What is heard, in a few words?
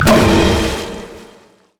nami hydroblast; LoL; koi